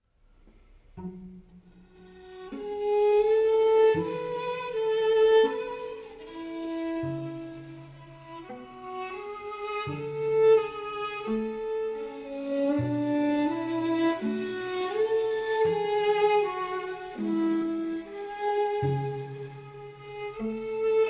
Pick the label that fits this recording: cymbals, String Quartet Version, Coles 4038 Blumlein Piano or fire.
String Quartet Version